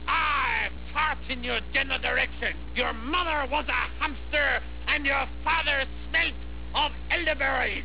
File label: fart